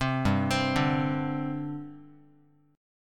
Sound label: G Suspended 4th G down Guitar; arpeggiated; 3,3,3,0,x,x; 4A,4E,5A,5D,5G#,6C#; JGuitar